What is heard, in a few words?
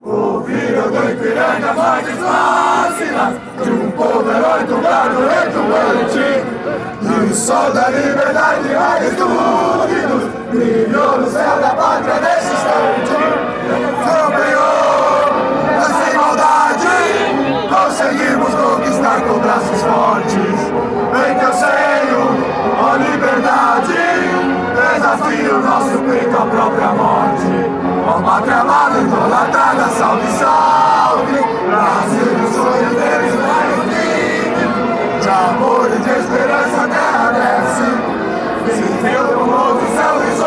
national anthem, fanchants; brazil